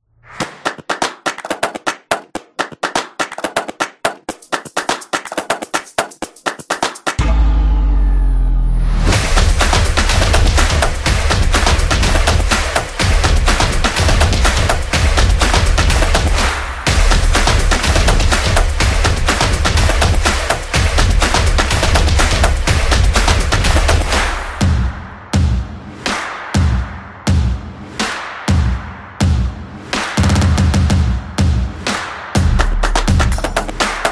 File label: The Sport Beat